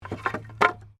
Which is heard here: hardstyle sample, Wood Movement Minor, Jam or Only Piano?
Wood Movement Minor